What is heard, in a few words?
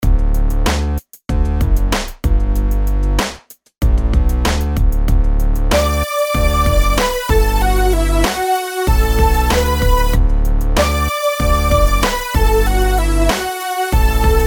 rhythms simple 8s major